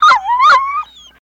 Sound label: loss